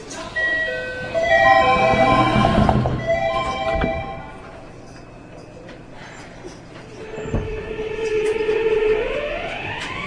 vvvf, mitsubishi; toei 6300

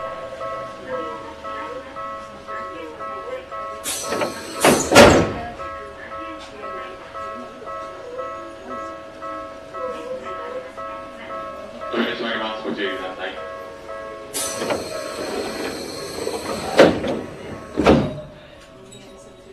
tokyu 7661; vvvf; toyo denki

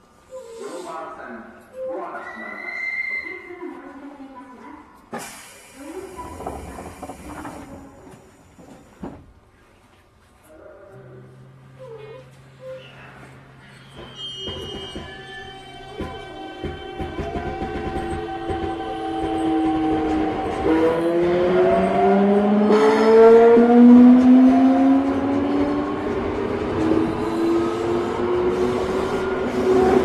vvvf, mitsubishi

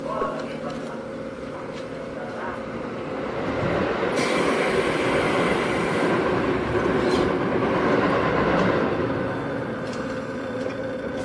tobu 20050; vvvf; toyo denki

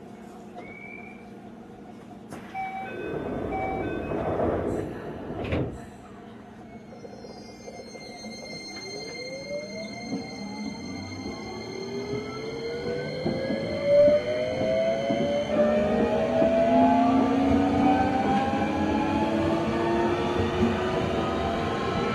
keio 1012; vvvf; toyo denki

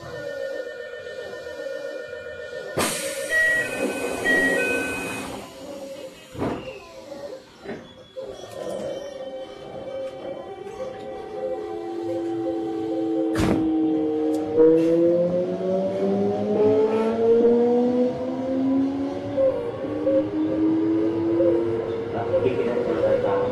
toei 5319, vvvf mitsubishi

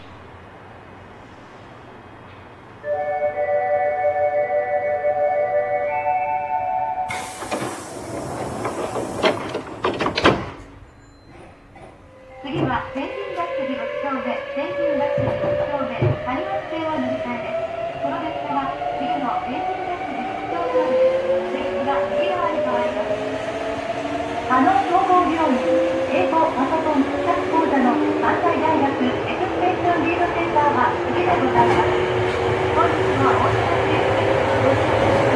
hankyu 8303; vvvf; toyo denki

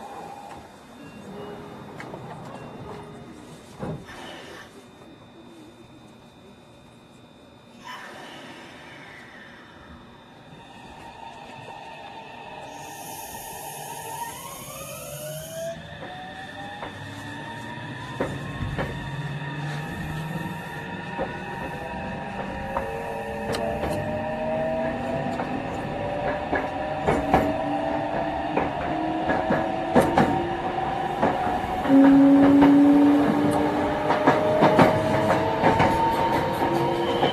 meitetsu 3300, vvvf mitsubishi

